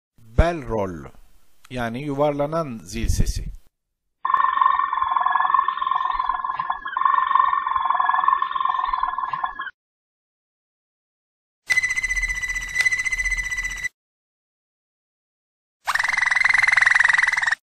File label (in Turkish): belrol, Malinua Kanaryası, Belrol, bellen ve rollende nağmelerinin birleşimidir. Zil sesi ile makaralı ötüşün iç içe geçtiği özel bir melodidir; Akıcılığıyla dikkat çeker